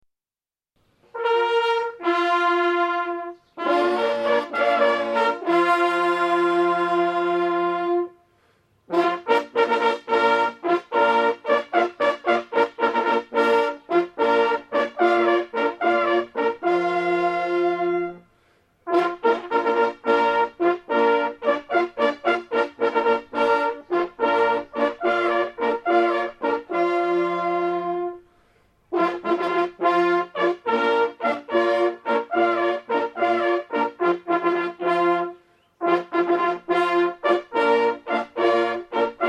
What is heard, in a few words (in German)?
Jagdhornbläser Begrüßung; Holthausen